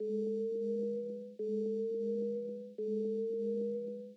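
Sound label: Attractor Prompt Loop; Insanely Twisted Shadow Planet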